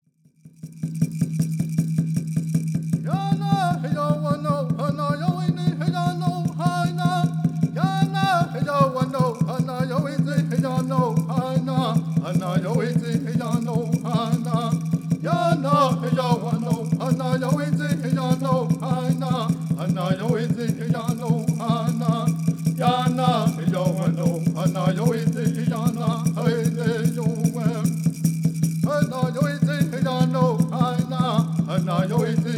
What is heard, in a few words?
Peyote Song